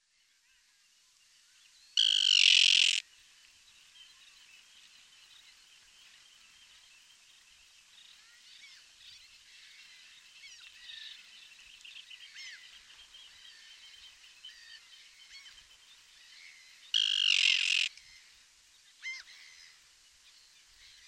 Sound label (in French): sterne pierregarin, chant